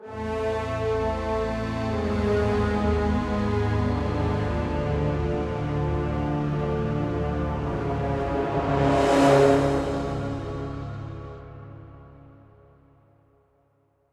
Death; Mass Effect 2

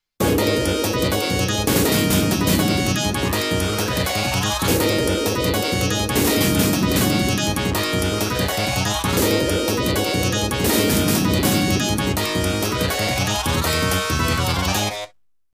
Area Clear; Omega Fighter; Atomic Robo-Kid

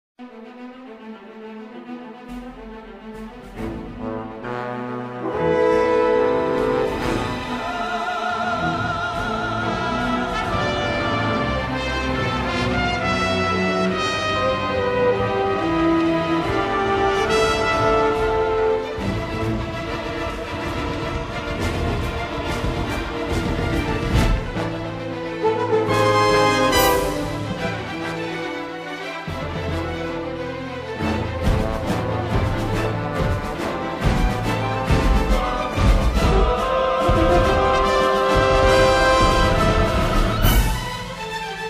Desperate Battle; Star Trek Klingon Academy; windows

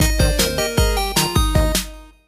Music Level Complete; Adventure Time Game Wizard Draw Your Own Adventure

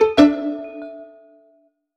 Jingle Castling; Clubhouse Games: 51 Worldwide Classics

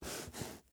FOOTSTEP Leather Wood Hollow Slide mono

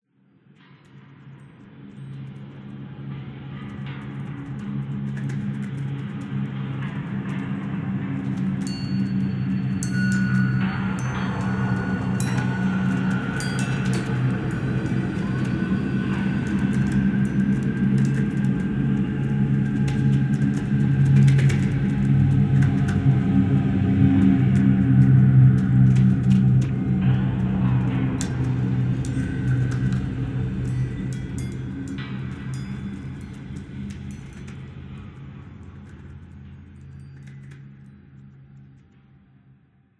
Bells Ambient

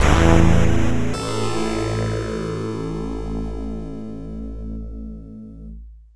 Died in Battle; prince of persia; pc 98

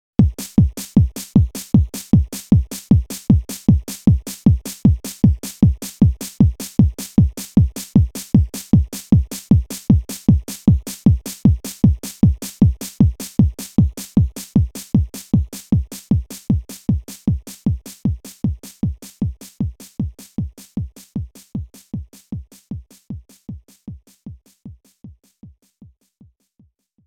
DRUM; Rail Chase; ENDLING 1

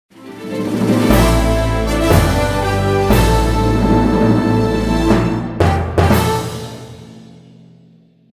Leitai Win; Age of Wushu; Windows

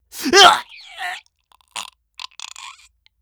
male damage; Lucius

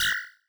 Hero Hit Shield

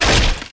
gib zombie; SAS: Zombie Assault 4

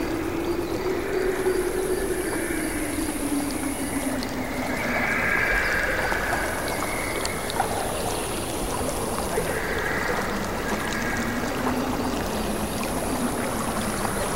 Ambience Naboo Plains; Angry Birds Star Wars 2

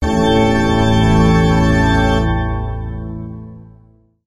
Stinger Organ

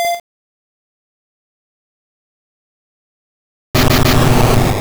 Egg Bomb; sfx; attack moves; pokemon; gen 2; gsc

